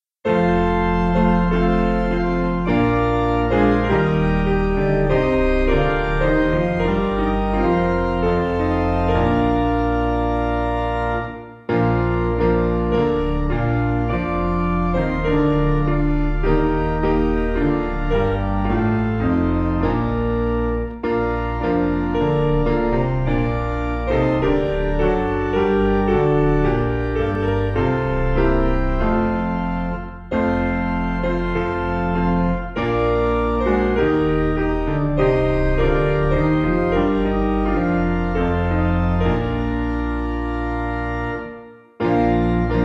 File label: Piano; Angel From The Realms; hymnary